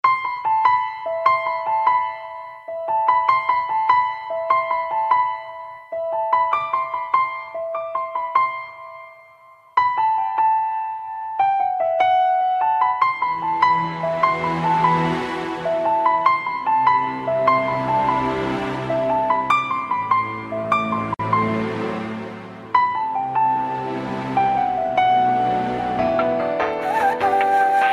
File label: Piano Dream, Valentine Tones, Ringtones; Valentine Day Special (2015)